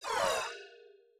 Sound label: voice Hit; aquariumboss